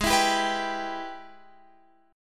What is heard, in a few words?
G sharp Minor Major 7th Sharp 5th G sharp down